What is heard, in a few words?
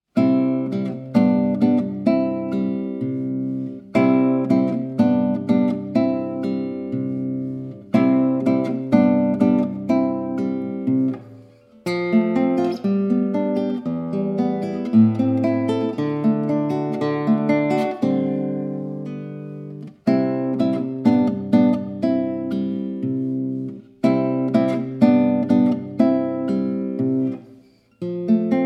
instrumental